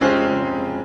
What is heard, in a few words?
example chord